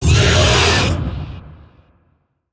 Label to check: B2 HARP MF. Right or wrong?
Wrong